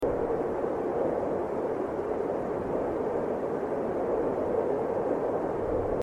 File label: wind; Mindustry